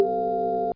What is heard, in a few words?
radar on; PC Gamer IT CD 41; machines demo